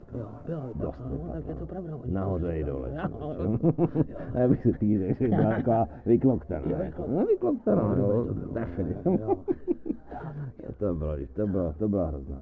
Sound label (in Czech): ambi sepot; Mafie 1